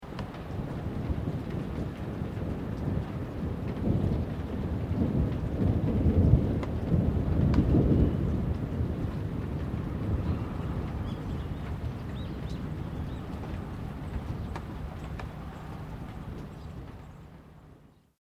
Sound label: THUNDER; Elemental weather effects; SoundEffects-Foundation4